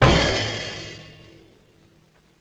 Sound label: Bass Drum Cymbal Hit; Vintage Cartoon; Drums & Percussion